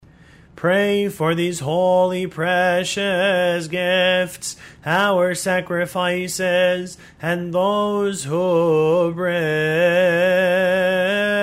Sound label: litany of the oblations, hymns; basil